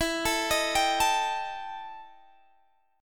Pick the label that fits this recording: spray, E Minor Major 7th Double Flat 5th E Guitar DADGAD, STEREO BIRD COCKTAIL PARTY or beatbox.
E Minor Major 7th Double Flat 5th E Guitar DADGAD